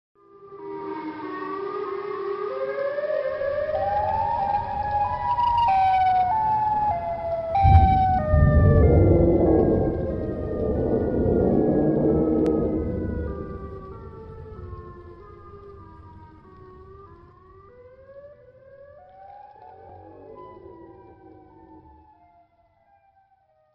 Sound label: siren head ringtone